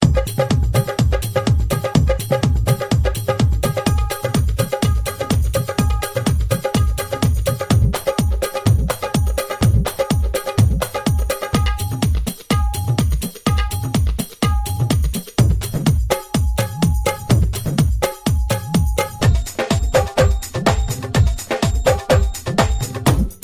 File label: BOLLYWOOD GROOVE